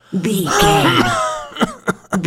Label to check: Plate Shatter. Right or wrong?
Wrong